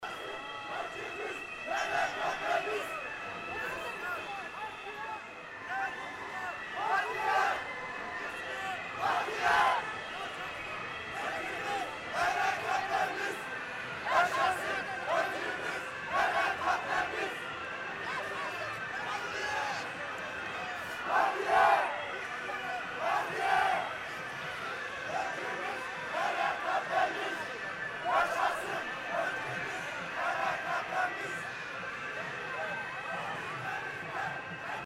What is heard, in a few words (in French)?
manif du premier mai